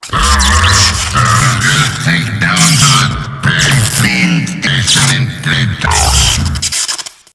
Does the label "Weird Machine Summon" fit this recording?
Yes